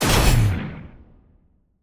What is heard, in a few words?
Tower Laser; Crater